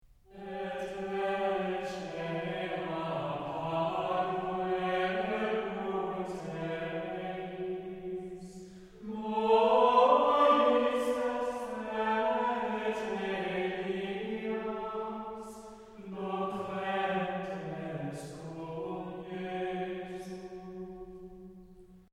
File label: Antiphon; Et ecce